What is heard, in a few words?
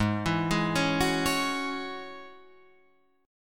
G sharp 7th Suspended 4th Sharp 5th G sharp, Guitar Drop D